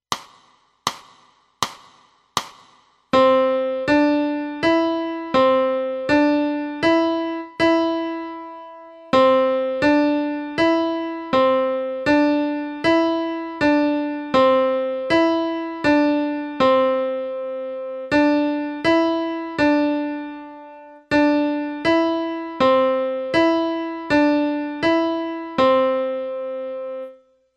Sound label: piano